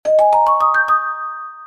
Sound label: ringtone